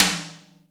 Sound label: RIM TOM; Big Fish Audio; Synth City